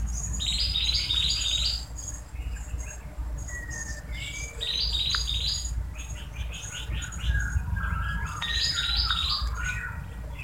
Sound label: Dark necked Tailorbird Orthotomus atrogularis